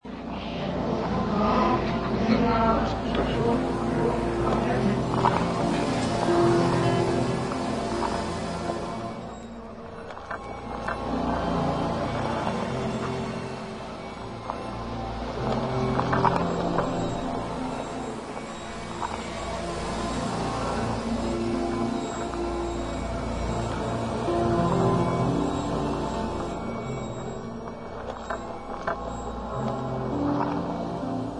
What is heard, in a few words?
AMBIENT EXPERIMENTAL